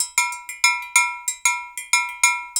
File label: Island Bash; Spectrasonics - Supreme Beats - World Dance